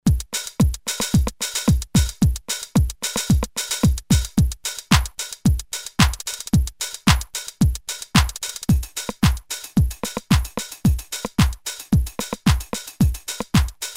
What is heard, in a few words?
TR909; ROM DEMO